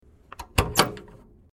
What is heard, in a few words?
heavy door lock unlocking